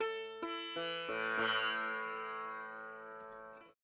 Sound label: SITAR ETHNO 125 A, Guitar; eJay